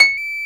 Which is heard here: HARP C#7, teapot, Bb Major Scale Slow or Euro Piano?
HARP C#7